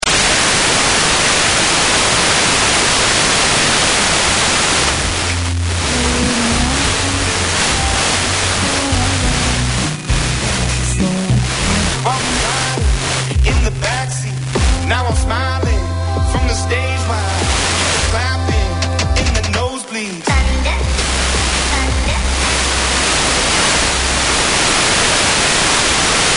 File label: slovakia